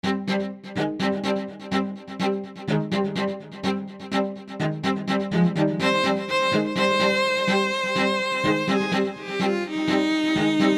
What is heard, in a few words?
cello that may not be played well